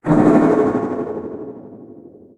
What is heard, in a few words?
cleave spin; smite; thanatos